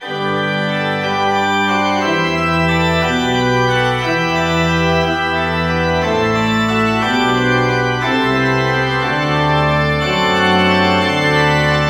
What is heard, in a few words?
church organ